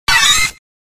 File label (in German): Ruf